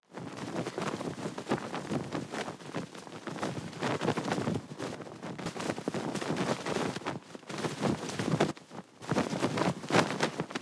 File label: Fabric Flapping